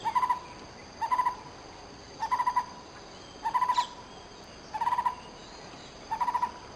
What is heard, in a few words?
Lineated Barbet calls WuuU; Amar